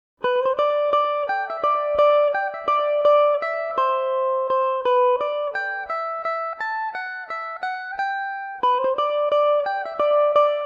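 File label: waltz G major revelation, irish mandolin; General Graham’s; Revelation Jaguar electric mandolin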